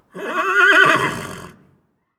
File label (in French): Hennissement